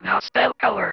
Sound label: Female vocals and robo-vox